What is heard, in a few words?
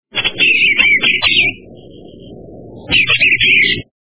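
bluebird call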